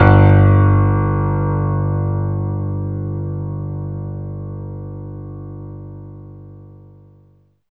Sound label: PNO G1; East West; Phat & Phunky